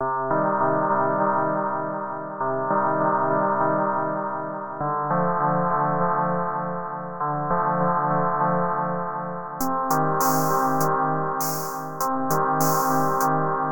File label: distorted relaxation